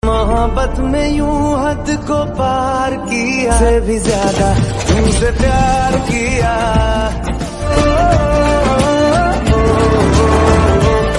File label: hindi ringtone